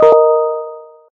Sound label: outlook notif; owa